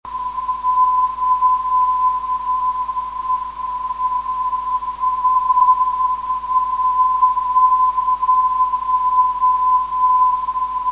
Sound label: 386khz